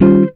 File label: GTR CHORD; Univers Sons; Jazzistic